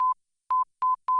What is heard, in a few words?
boop; matilda